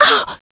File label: pain; cstrike